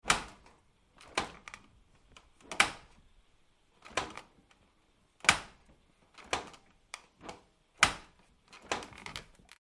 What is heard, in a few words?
Dishwasher Door Open and Close, Various; Appliances; Household; Tom Hutchings